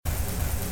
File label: Scatter First; Shengxiao